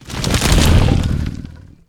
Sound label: War Golem Attack